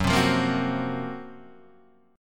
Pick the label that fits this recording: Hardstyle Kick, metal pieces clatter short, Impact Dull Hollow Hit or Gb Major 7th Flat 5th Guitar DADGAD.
Gb Major 7th Flat 5th Guitar DADGAD